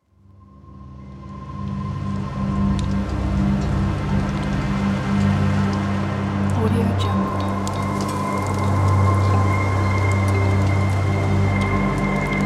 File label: mystical soundscape